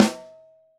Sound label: Top, Snare